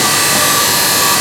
SAWS; Zero-G Ambient 1

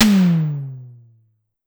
Electro Tom 1 Hi, Clavia DDrum AT, Pitched Down

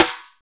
SNR R8 RIM; Snares 2; Sn Modules 2; Roland - Rhythm Section

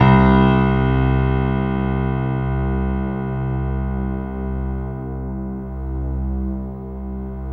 PIA, STRG; Best Service Gigapack 1

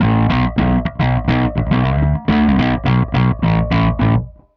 Bass, Indie Rock; Sonic Foundry (Sony Creative Software); Indie Rock Anthems

